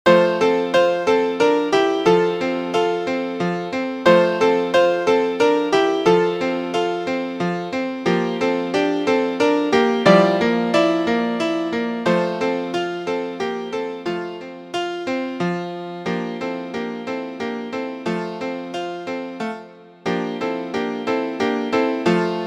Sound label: MIDI